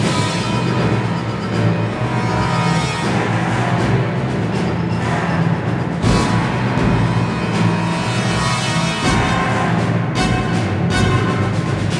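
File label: loop; Star Wars Bounty Hunter; mandorage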